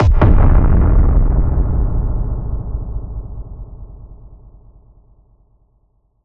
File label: Impact